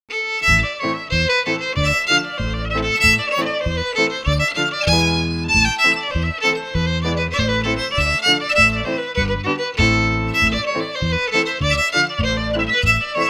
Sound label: FIDDLE PIANO; Jink & Diddle Book o' Tunes 2022